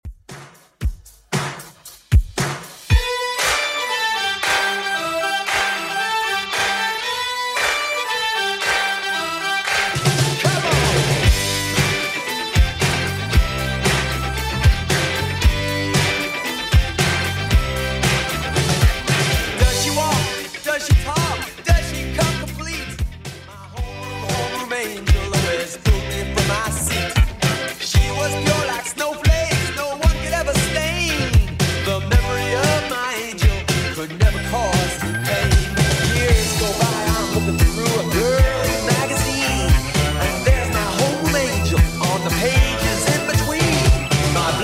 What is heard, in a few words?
80s Redrum 115bpm